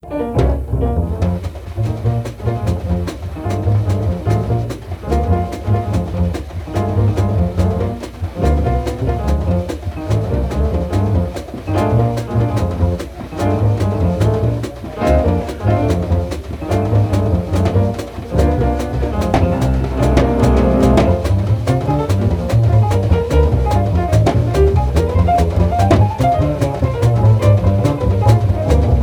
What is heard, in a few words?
Blues after, Mastering